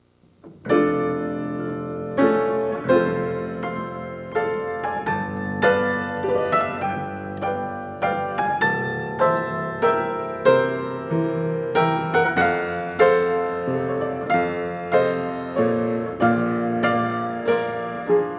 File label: piano